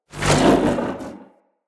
Casting Device Projectile Whizz By Heavy; Weapons; Player

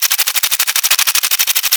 Mech Loop; Weapons; Warframe; Tenno; Ink Rifle

